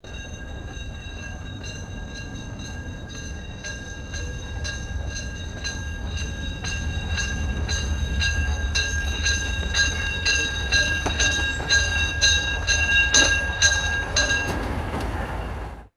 distant railroad crossing bells ringing

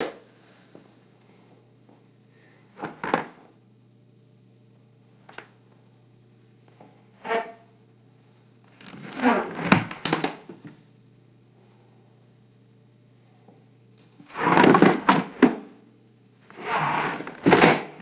light wooden chair scraping and bouncing

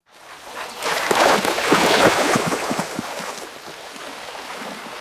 horse galloping through deep splashing water; footsteps

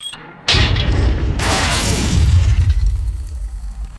sharp synthesized mechanical clunk

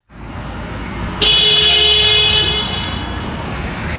distant emergency vehicle horn blast; vehicles

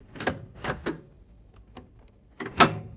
heavy metallic seat adjustment lever clicks; mechanical

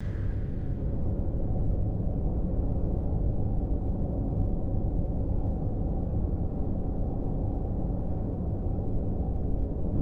low hollow industrial room tone drone; ambience

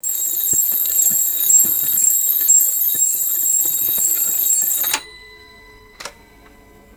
mechanical twin bell alarm clock ringing; alarms

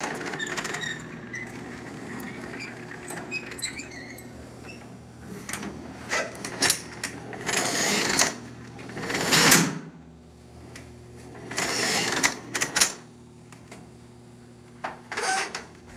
slow mechanical lift rolling and squeaking

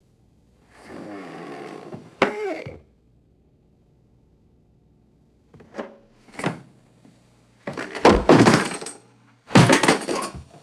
wooden chair falling on concrete

